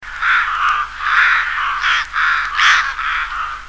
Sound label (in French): freux